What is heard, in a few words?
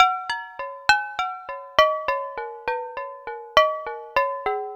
Struck Glass Melody; Ambient; Melodic; Ambient-Melodics